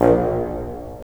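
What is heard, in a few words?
wind bassoon; LA Composer vol. 3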